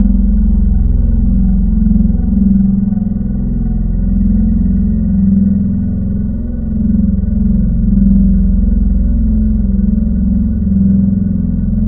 Vocal Table, Wavetables; Synthetic's Serum Collection; ESW Core Wavetables; Echo Sound Works Core Tables

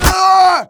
death, player; Team Fortress 2

